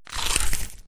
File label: hunger eat; hud hunger